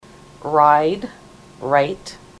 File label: ride right